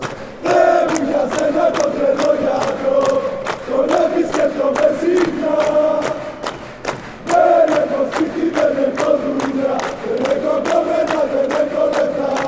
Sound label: chant